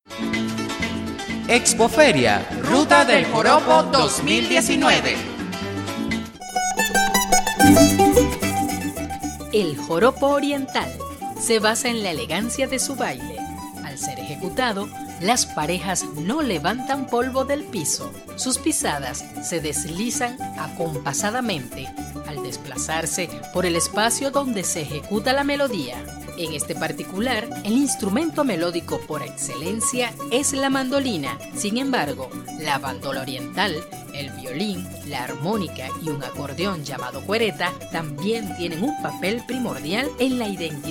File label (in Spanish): JOROPO ORIENTAL